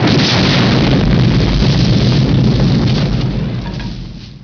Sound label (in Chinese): 爆炸音效; 爆炸音效2